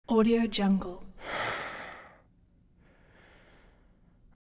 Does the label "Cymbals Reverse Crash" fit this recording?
No